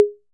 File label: hi conga 808; E-MU Formula 4000 Series Vol. 2; OrbitPercMaster